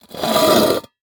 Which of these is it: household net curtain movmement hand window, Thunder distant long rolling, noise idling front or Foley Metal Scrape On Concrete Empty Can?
Foley Metal Scrape On Concrete Empty Can